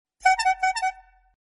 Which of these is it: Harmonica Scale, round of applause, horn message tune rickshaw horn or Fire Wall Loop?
horn message tune rickshaw horn